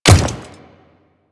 Default Step, Footsteps; Royal Guard

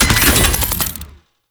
Ammo Station Activate; Veilbreaker; Grineer Forest Thumper; Kahl

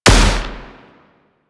Shot; DE; Warframe; Weapons; Archwing; Arch Anti Aircraft Gun; Kuva Grattler

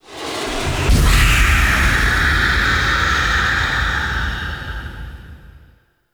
Exterior Jealous Sky Screech

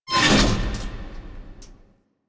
Vent Enter; Warframe; Venus Open World; Venus Solaris United; Fortuna

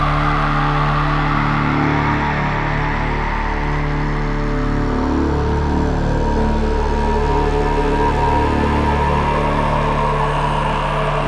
Waiting Room Cue, Music; Stingers

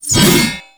Backstab Impact Target; Warframe; Warframes; Pax Duviricus

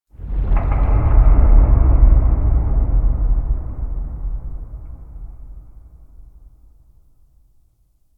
Stalker Cave Underwater Rattle; Ambience; Warframe

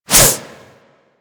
Boomerang Whoosh; Warframe; Weapons

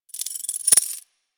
Collar Jingle, Pets; Warframe; Zanuka

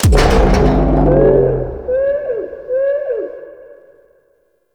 Bomber Warp In; Warframe; Eidolon; Grn Bomber

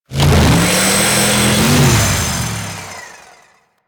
Saw Melee Swing Heavy; Weapons; Warframe; Grn Ghoul Saw Player

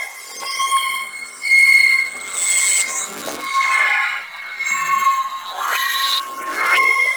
Defend Song Loop; Enemies; Warframe; Vomvalyst; Teralyst

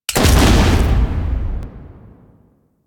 Shotgun Shot; Weapons; DE; Warframe; Tenno; Pod Shotgun

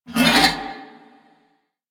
Ram Grunt; Animals; DE; Warframe; Quests; Duviri

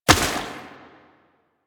Step Water

